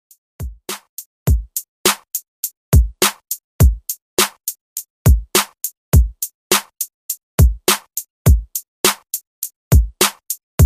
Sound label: Christmas Redrum 103Bpm